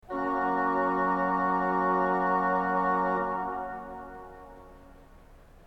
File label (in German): ton, orgel